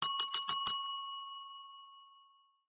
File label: Call Bell Tap Style